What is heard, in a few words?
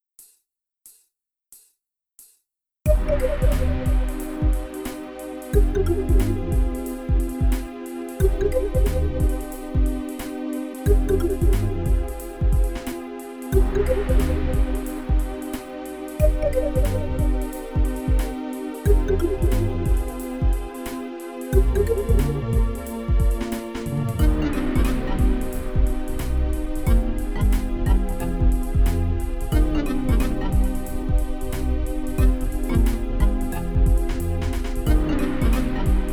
fitness music for stretching, 432 hz